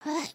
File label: generic build; emotes